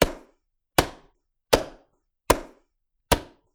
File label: clap; Gendered social sounds